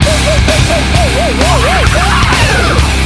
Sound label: Sirens